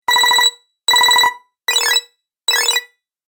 Game Collect Your Coins Pack, Video Game Sound Effects